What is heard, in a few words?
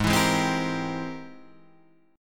Ab Augmented Major 9th Ab Guitar Open D